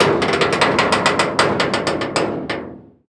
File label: turret; World of Tanks